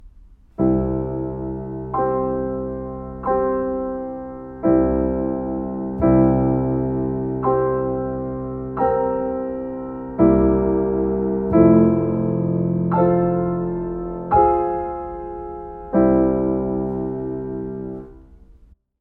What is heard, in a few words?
seesaw live piano accompaniment; In Harmony; EYFS and Key Stage 1